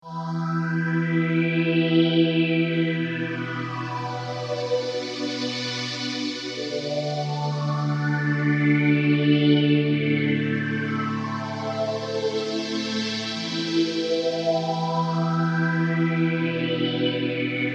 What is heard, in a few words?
Hohner orch